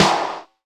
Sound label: POP SNARE, Drum Mashines, SR-16 DRUMS; EdgeSounds